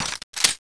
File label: elite left clip in, weapons